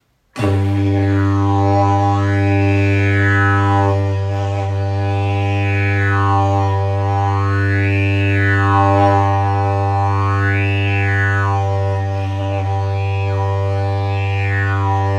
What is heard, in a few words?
Didgeridoo